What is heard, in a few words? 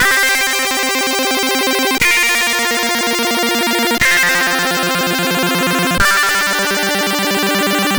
C64 Chord, 8-Bit chiptune